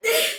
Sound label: Pain Soldier Female, Battle Barks; Dialog; Sinecure